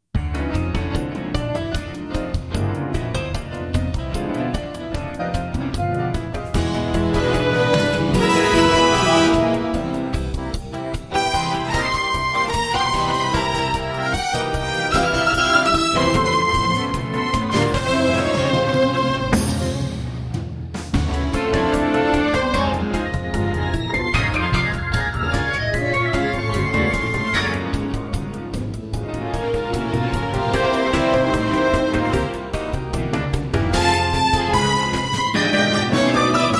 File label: the opening theme of a showa era detective drama. its courageous and melodious guitar melo